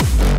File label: Hardstyle Kick